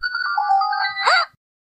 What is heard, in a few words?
Wiimote Sound; Zelda